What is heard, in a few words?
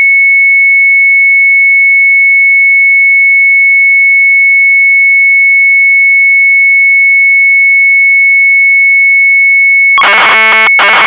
MZ800 Tape, sharp; DANZA3; retromelsarchive